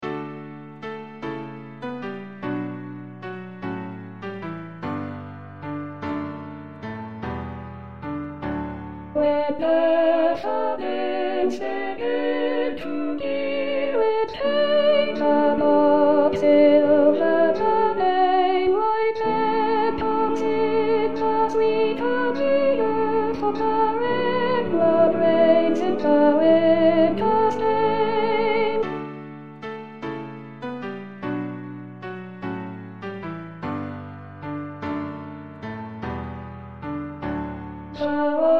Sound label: When daffodils Sop